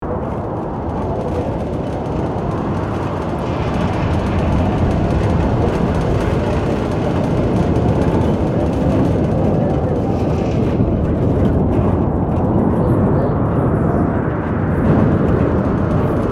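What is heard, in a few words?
Ambience Blizzard Interior Exterior Mix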